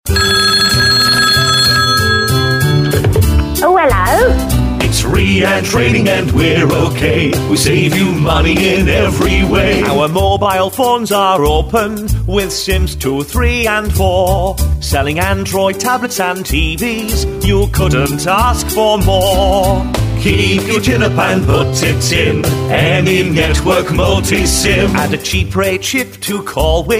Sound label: Comedy Sung Jingles